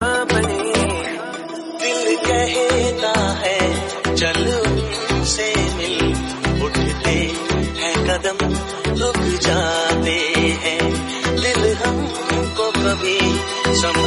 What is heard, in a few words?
REMIX RINGTONE